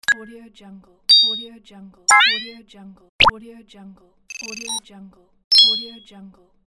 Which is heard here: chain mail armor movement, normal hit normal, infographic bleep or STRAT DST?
infographic bleep